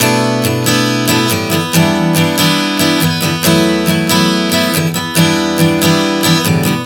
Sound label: Bm-Em-F#m-Em 140 bpm, acoustic guitar chord progressions